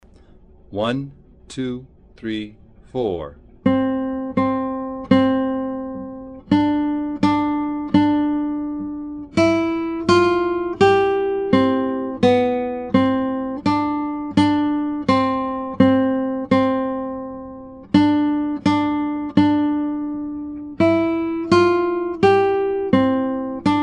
Sur le Pont d'Avignon, gitara

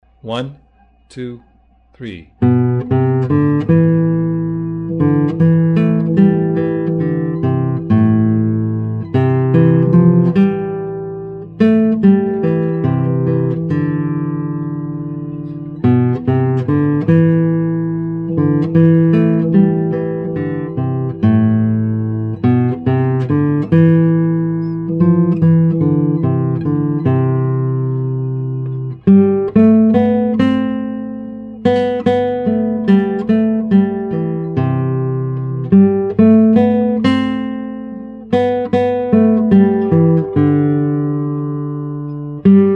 Londonderry Air, gitara

